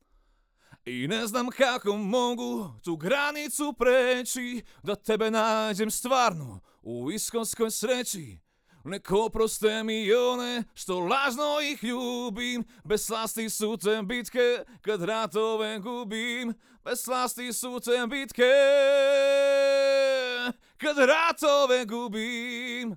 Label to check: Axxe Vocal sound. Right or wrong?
Wrong